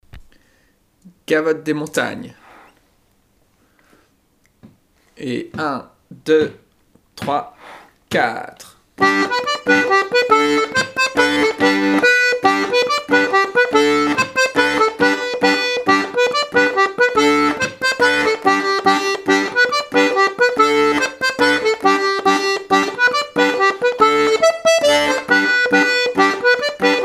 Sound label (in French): gavotte des montagnes